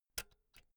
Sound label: pull out jack cable slow; objekte; elektronik